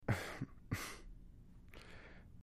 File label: Breath Sigh Light; breathing